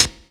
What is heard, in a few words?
HIHAT; E-Lab; X Static Goldmine 2; akai